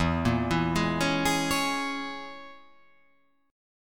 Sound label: E 6th Flat 5th, down; E6b5 arpeggiated; JGuitar